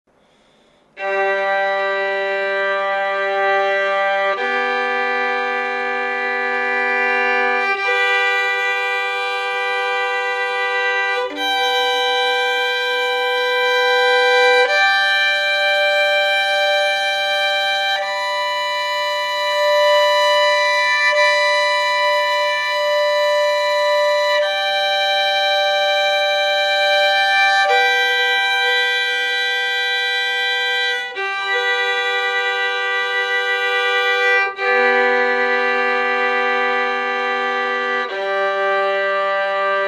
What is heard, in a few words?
doublestop G dur